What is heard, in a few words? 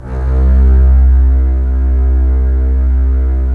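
STR CBS, Strings; Roland - LA Composer vol. 4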